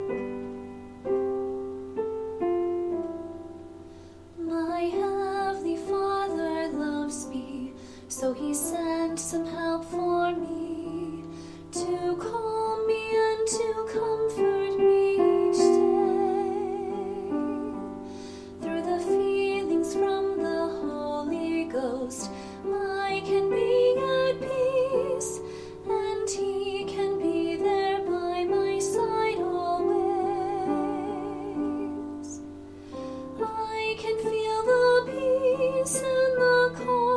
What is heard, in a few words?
The Holy Ghost, Vocals